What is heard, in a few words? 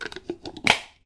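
close instant coffee jar; cooking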